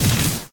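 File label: armor, movement; smite; heimdallr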